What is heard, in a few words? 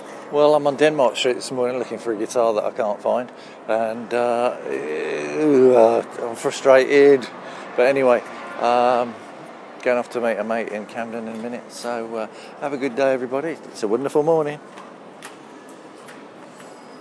guitars; denmark